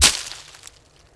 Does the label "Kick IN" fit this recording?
No